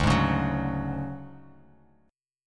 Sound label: D Major 7th Flat 5th D down, chord; strummed; JGuitar